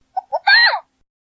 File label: Wiimote Sound